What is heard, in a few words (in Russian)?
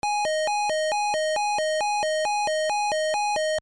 domofon vizit